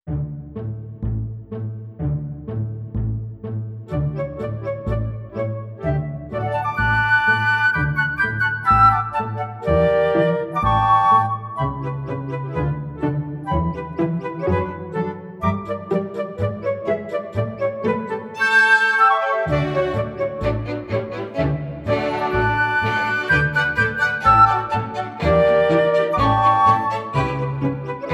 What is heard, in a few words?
Fingers and Hands